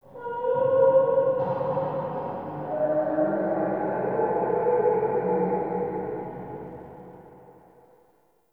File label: Root Cave Distant Moan; Ambience; Neathuns Root Cave; One Offs